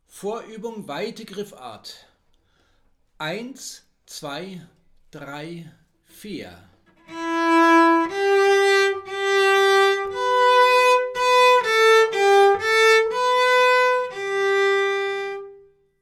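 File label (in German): Vorübung Griffart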